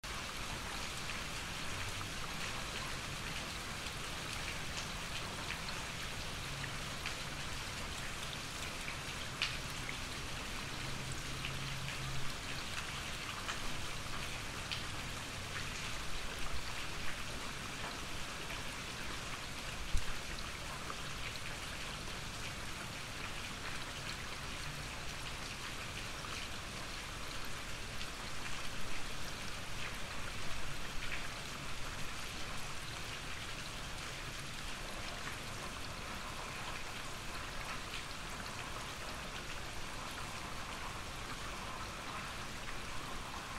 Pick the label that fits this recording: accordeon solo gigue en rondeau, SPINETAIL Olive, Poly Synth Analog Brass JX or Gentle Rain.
Gentle Rain